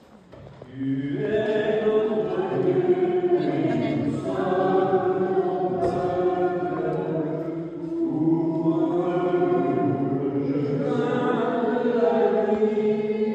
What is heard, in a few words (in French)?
chant tu es notre Dieu; EGLISE